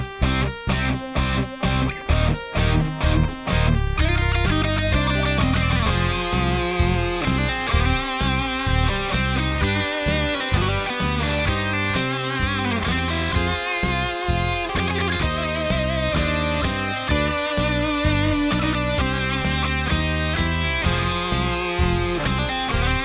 Solo, Diezel VH4 and 4x12 cab; EBMM JP6

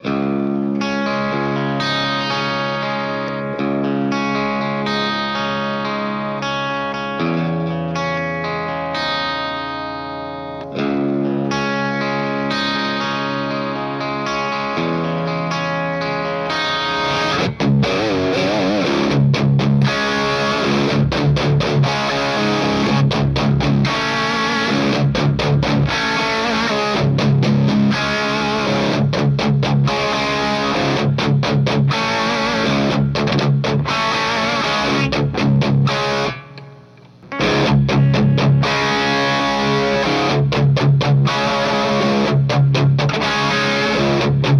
stereo amps fooling around; running two amps with a stereo chorus pedal

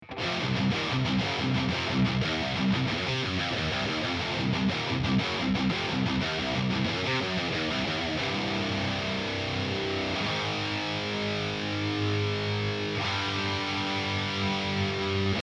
amp; huge amp shootout

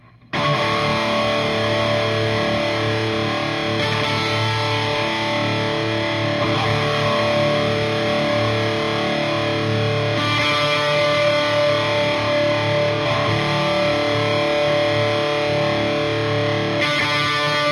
chord rhythm

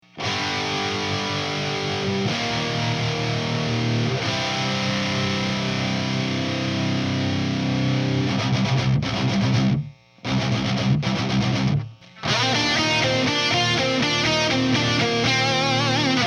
amp

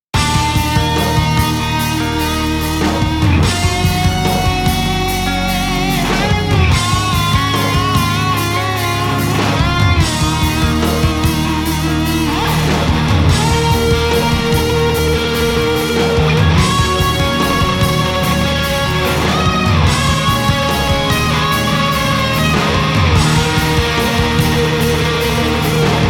vh4 1st recording

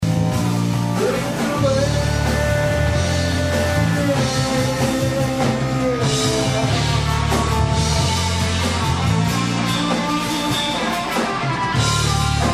solo; thd flexi 50; mesa tremoverb; mark iv

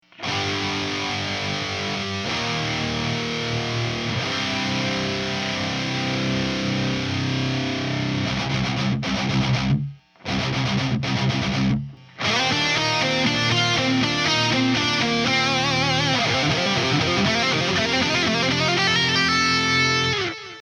amp

